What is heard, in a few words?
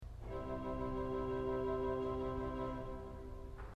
II vox coelestis 8 C